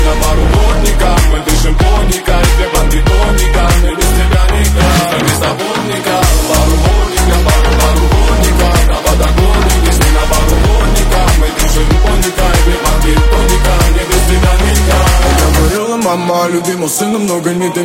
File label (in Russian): remix rington